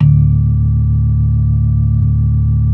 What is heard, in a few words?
B3 D 3; Best Service; Hyper Dance